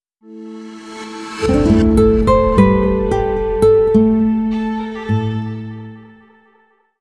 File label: minigame lose; MiniGames